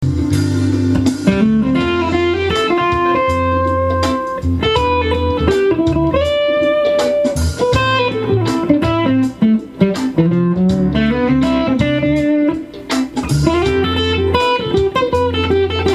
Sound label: am backing track solo